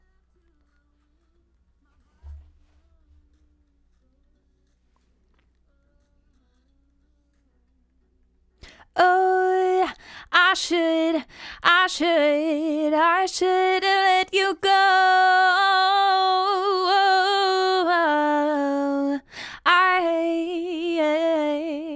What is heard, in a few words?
Vox